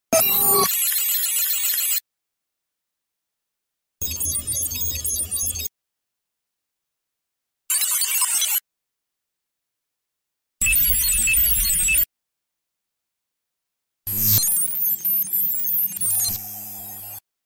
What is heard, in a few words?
Galery Noise; Sound Ideas - Galery Noise 002